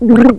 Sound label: gurp; vortex; unit02